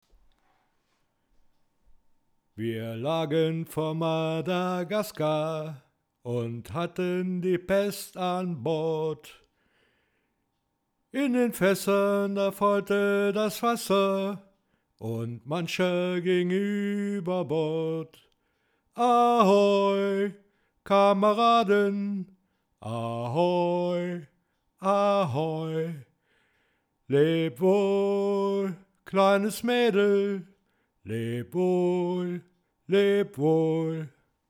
Gesang ohne, Voice Executor; Audified

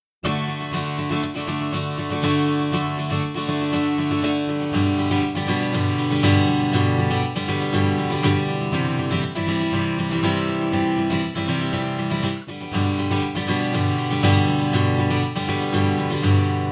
gitarre mit

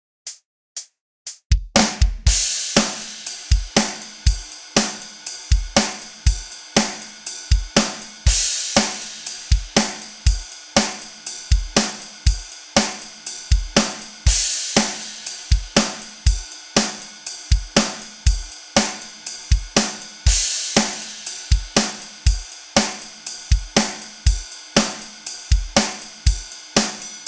eq drums manuell

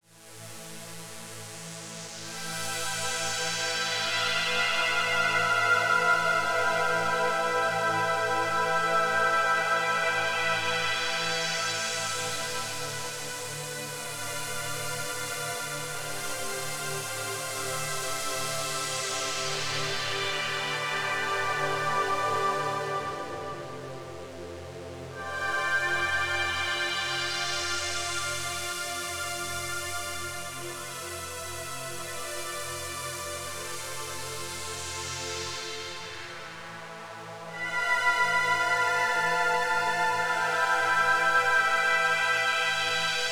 synth ohne

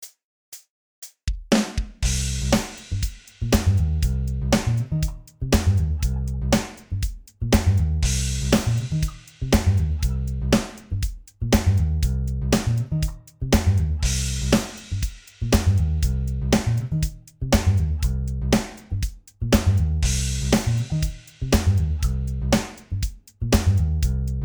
drumsandbass ohne; Impact Soundworks

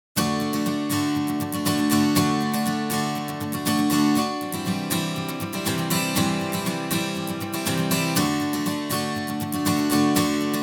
Akustik Gitarre, Testbericht – Softube Volume 1; EQF100

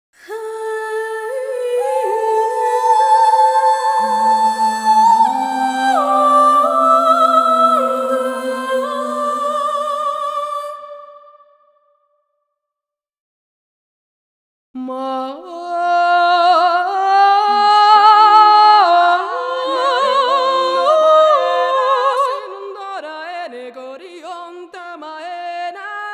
vocal codex stimmen; era ii; eduardo tarilonte

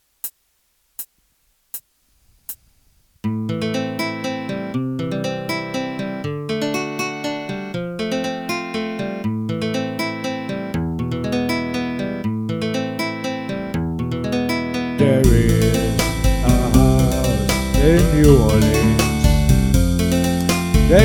Gesang mit air; scarlett

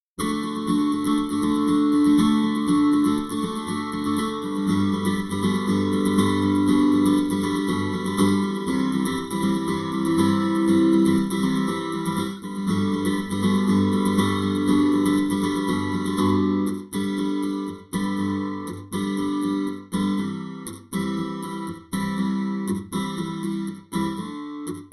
gitarre mit

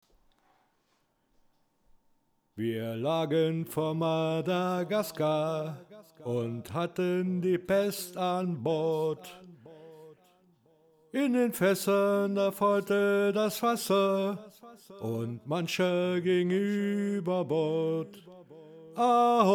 Gesang mit delay; TNT Voice Executor Plugin; Audified

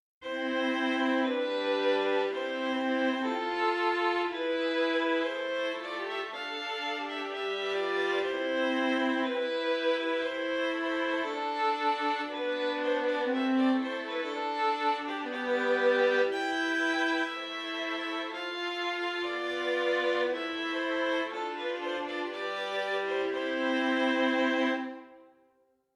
Violine; Pearl; spitfire sacconi strings quartet

